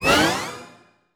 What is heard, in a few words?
Pitched Sting; Orch Perc and Stings; cartoon; musicradar; cartoon caper